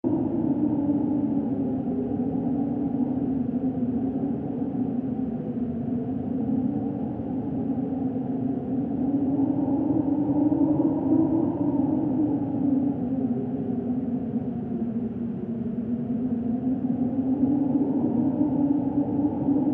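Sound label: Wind Howl Drone Mourn Loop; the unfinished swan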